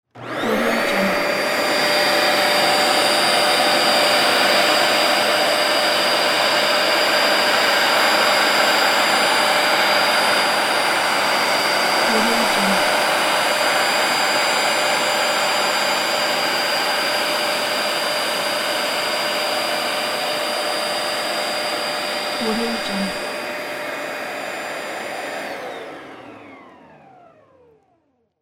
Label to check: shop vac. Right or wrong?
Right